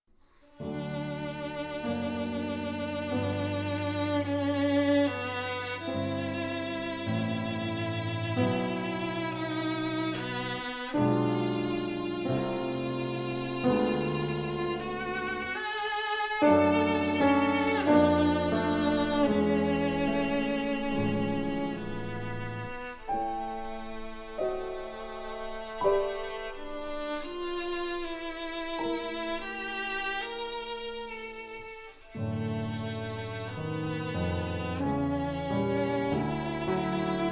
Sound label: Reger Sonate